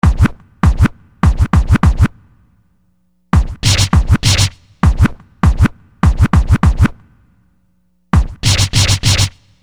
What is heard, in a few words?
Scratch, Hip-Hop; Construction Kit; Sonic Foundry (Sony Creative Software)